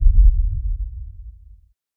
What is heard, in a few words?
low frequency cinematic, distant